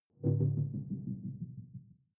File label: sci-fi critical bell